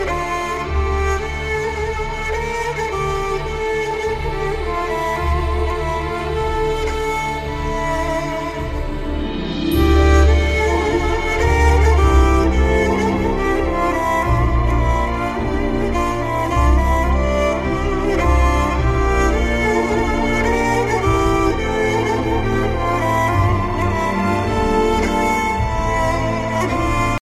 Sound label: Emotional ringtone